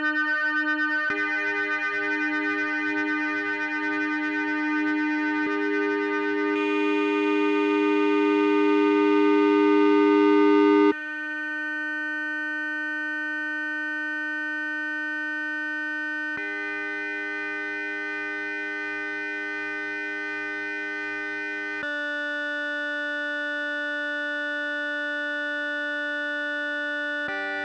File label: soundscape